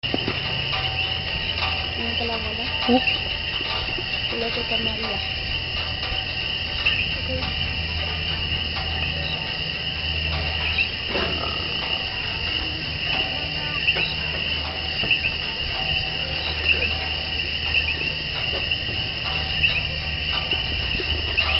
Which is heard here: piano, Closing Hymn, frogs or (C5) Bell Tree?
frogs